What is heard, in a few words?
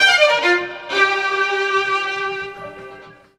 STRG; USB Soundscan vol.32; Roots Disco; AKAI